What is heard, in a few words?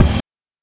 BD + HAT; NES DPCM; MIDINES